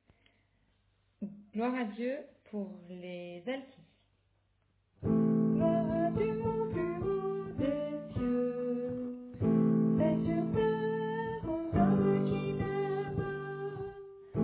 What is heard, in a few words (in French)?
Gloria Alto